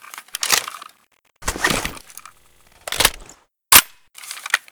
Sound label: fal reload